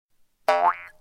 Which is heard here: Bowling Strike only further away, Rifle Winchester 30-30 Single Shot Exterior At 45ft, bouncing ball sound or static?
bouncing ball sound